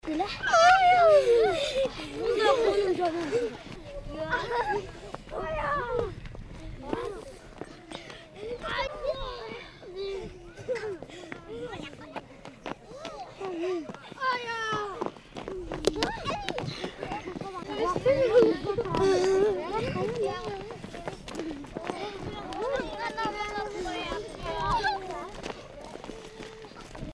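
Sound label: children running and playing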